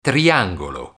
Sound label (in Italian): triangolo